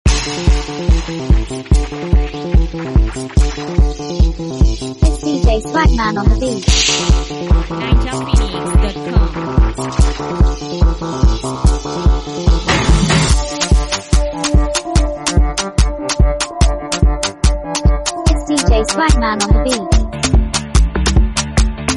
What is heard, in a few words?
Dance Beat